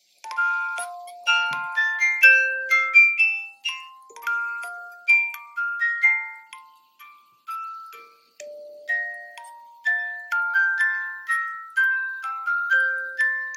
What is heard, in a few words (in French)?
Guitare, Musical Guitar